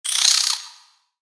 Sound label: Spider Exert; Lego; creatures mechanical